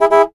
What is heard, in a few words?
Horn